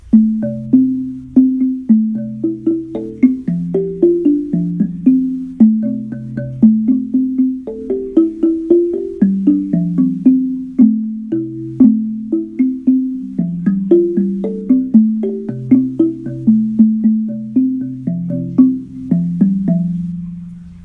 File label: hardwood drum